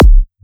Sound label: Kick, Psytrance; Drum Shots